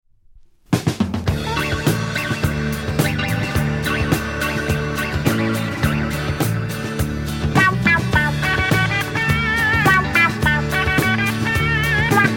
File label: guitar